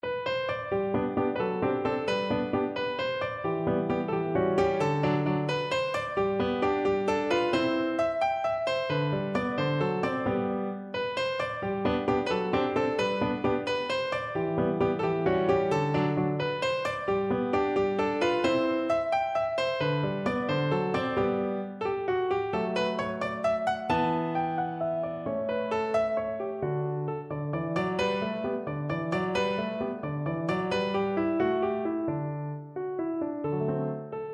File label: sonatina g